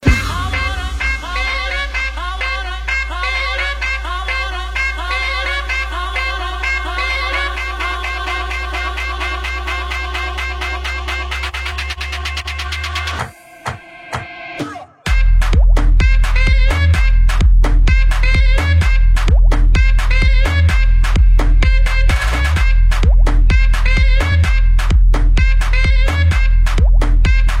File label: Afro Beat